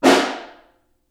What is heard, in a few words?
Snare Far; Ableton Foundation FreePacks; Snare Designer